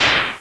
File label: Window Close